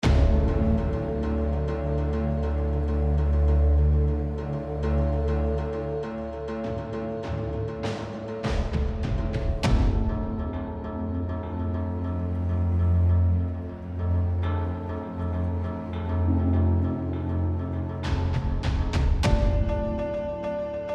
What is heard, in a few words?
Tension Combat Alternate